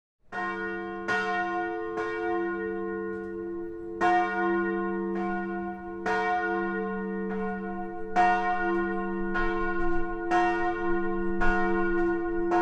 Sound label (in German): gelaeut der feldkirche